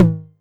Tom Hi, Novation Drumstation 909

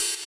Ride; Equipped Music; Loungin House

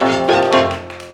ZIMB HIT; Best Service; World Colours

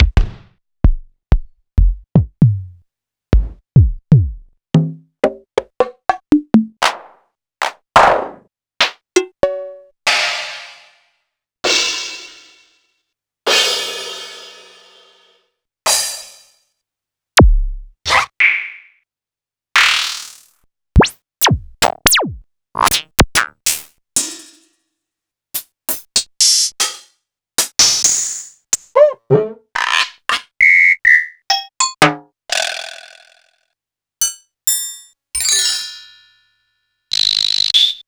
Quasimidi Technox

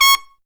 TRUMPET FX; Zero-G; Phantom Horns

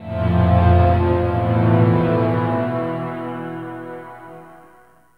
Elektrik Drone, Moving; Sonic Foundry (Sony Creative Software) - Friendly Fire; DroneLoop

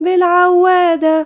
YOUNGIRL, Arabian Traditions

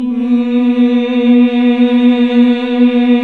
Nuns Mmmhh; Sound & Vision - Gigapack II

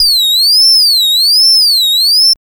HOUSE ALARM; Masterbits - Tekkno Trax; MACHINES+FX

